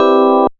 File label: Classic Organ Chord; Big Fish Audio; OSH Chords